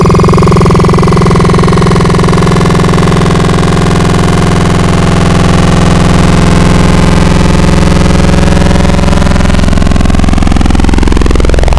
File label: 8 Bit, Growl Wavetables; Cymatics; Virus Wavetables; Serum